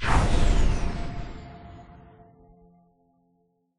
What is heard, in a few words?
humid boost; Minecraft